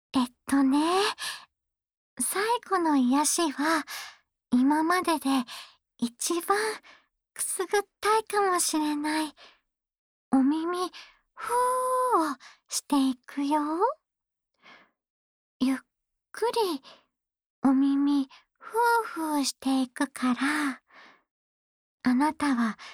ゆったりお耳ふーふー(右), ASMR

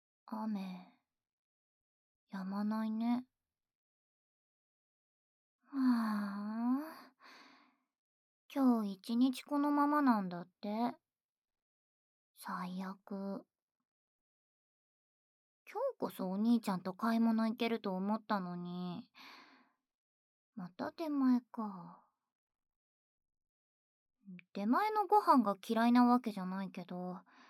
妹の耳かき SEなし; 2日目・午後

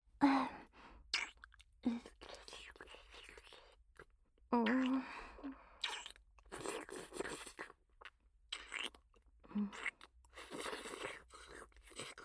乳首舐め, ASMR

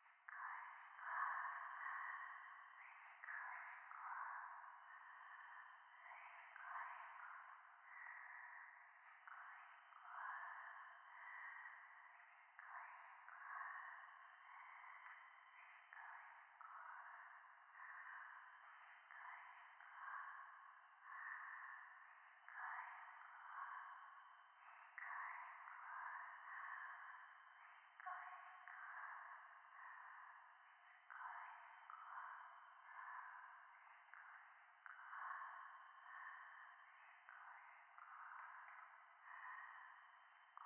廃墟 しこしこ 山奥

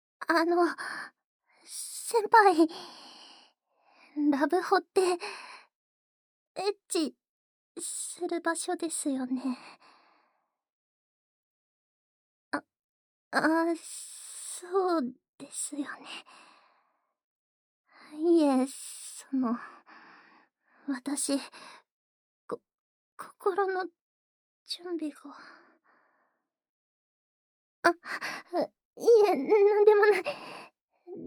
耳かき＆耳舐め, ASMR

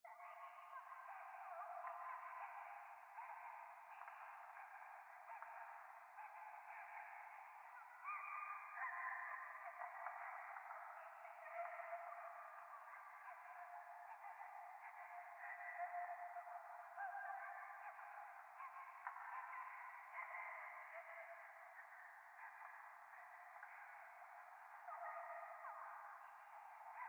ゴースト 可愛い喘ぎ 電車

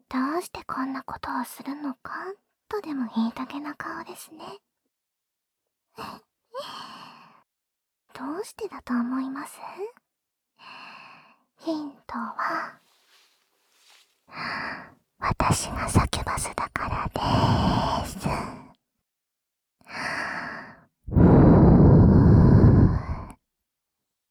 小悪魔的♪ねっとり耳舐めカウント手コキ♪, ASMR

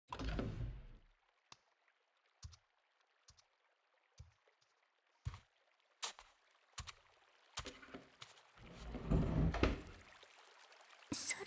シャンプーとオイルマッサージ; お嬢様による初々しいシャンプーとオイルマッサージ～おじいちゃんおばあちゃんになっても～

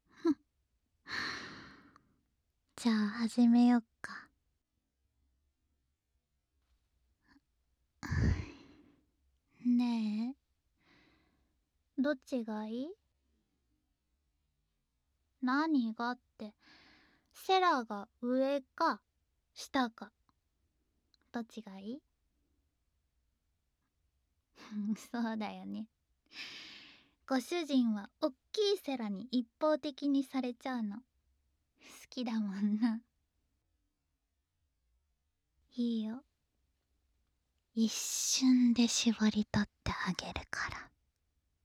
乳首責め＆耳舐めループ

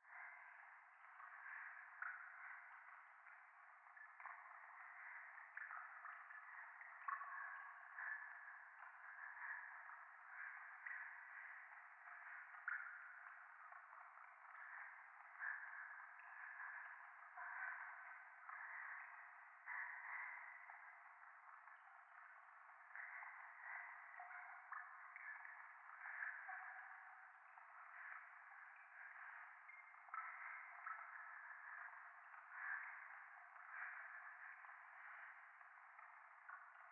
廃墟 右耳快感吐息 雨上がり

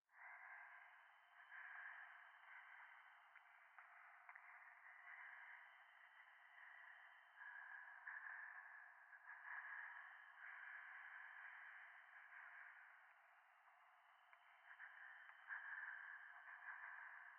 ゴースト 右耳快感吐息 山奥

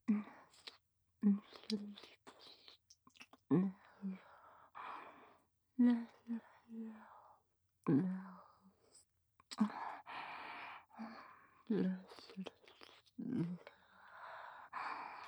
乳首舐め(レロレロ)

